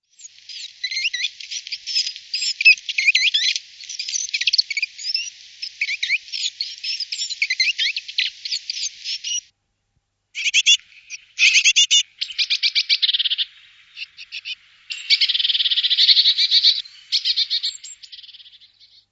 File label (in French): mesange charbonniere, oiseaux chant